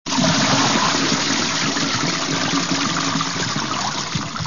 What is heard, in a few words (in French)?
liquide ecoulement wc